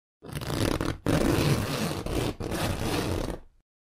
molniya sumki

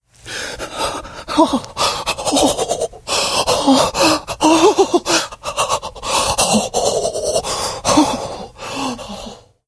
mujyk silno zamerz i trasetsya

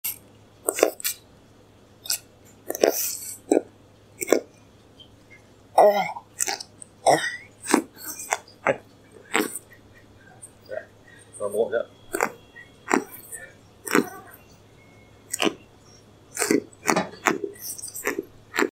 fuga

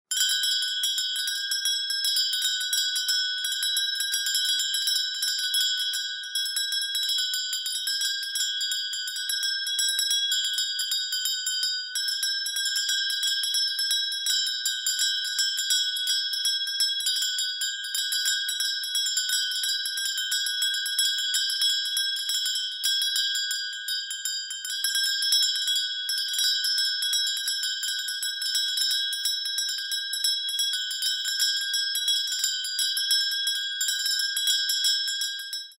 zvon kolokolch

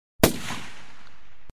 svist puli

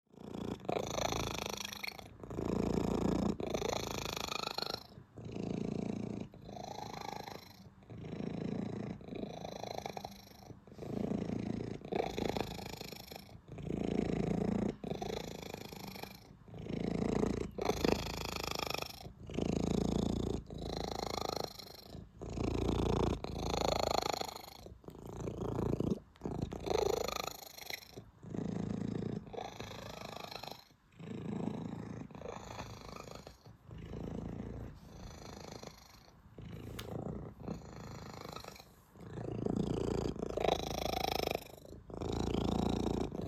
murchanie koshki

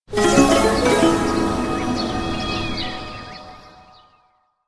zloe volshebstvo

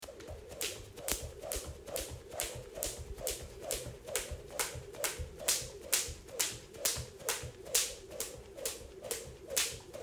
skakalka

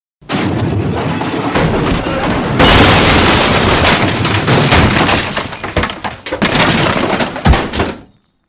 pogrom mebeli v dome

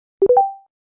zariad oppo

